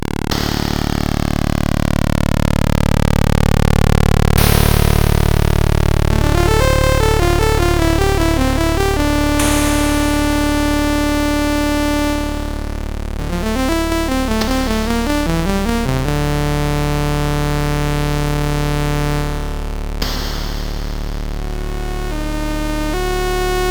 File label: Project Firestart, MOS6581R4